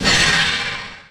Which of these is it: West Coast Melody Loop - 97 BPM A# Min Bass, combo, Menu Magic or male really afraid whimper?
Menu Magic